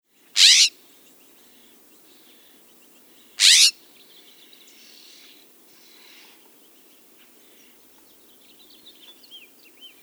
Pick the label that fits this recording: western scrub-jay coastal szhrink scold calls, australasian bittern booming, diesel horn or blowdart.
western scrub-jay coastal szhrink scold calls